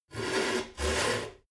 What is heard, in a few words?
sawing